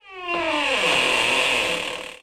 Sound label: door squeak